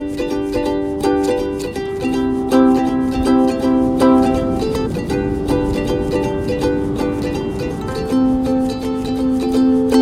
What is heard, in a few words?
Ukulele